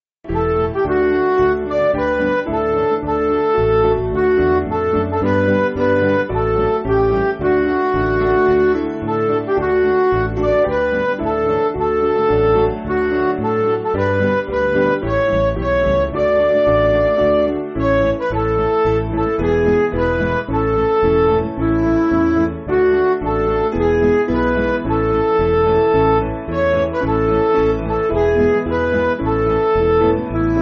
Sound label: Midi